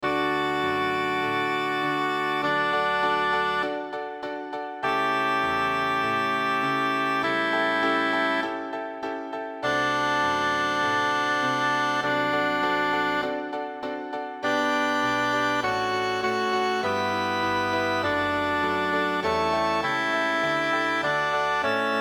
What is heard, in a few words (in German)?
sanctus tenor; mozart wolfgang amadeus; requiem